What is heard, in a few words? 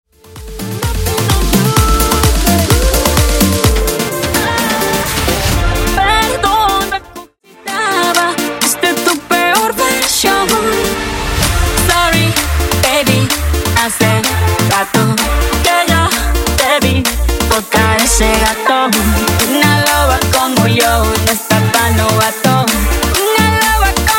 Dj Intro Outro Clean 128 Bpm